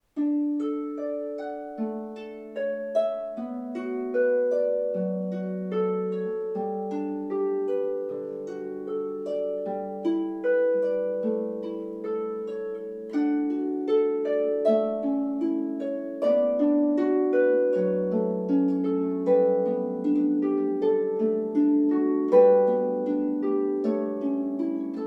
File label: Pachelbel Canon, harpist